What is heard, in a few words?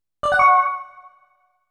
menu open Game Menu (Open); dot hack